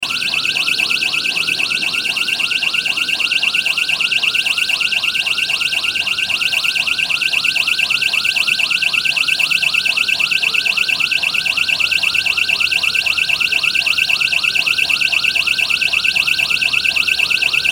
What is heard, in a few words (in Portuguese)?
alarme disparado efeito sonoro